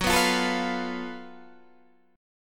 F sharp Minor Major 7th Double Flat 5th, Guitar, DADGAD; chordshape; down